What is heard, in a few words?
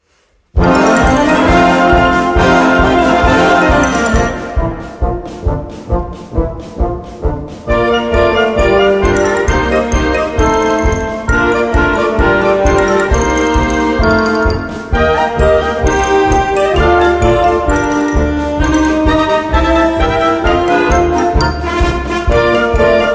Music Hall